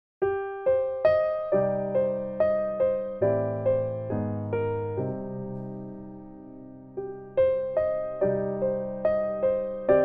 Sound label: parallel minor chords; godfather